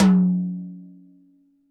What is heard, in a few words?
TD-20 Tom B, Roland TD-20 Toms